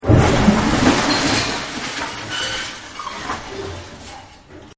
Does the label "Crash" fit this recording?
Yes